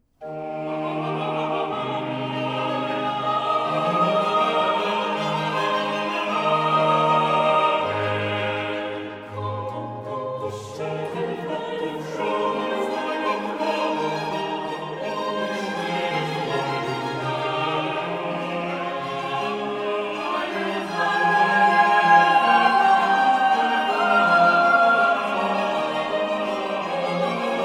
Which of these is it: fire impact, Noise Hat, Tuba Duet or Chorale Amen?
Chorale Amen